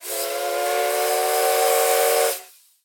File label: locomotive whistle